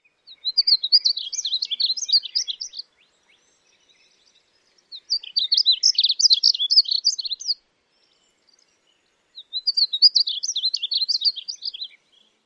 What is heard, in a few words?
song; TX